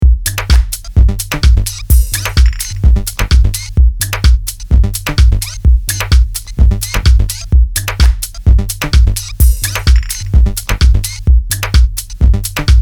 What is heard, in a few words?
128 deep tec beat; Loop Mania Sampler Pack